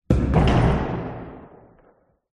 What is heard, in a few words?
BBall Backboard